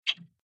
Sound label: two step; kritter krawler